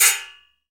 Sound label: RECO-RECO, Latin Hand Perc; E-MU Producer Series Vol. 2; More Studio Essentials; Composer